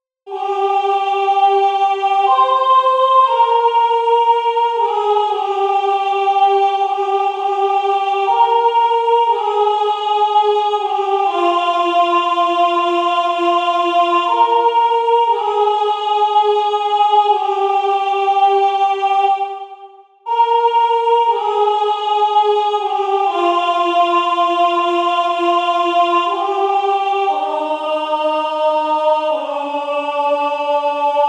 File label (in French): soprane